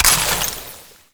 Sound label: Ammo Pickup Gas; Ammo Ability; Warframe; Warframes; Frumentarius